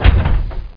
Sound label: MOVE STOP